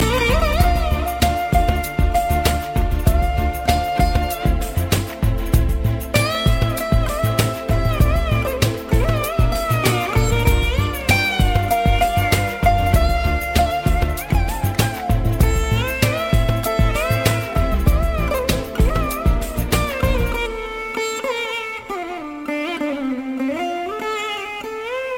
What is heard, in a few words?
Indian Music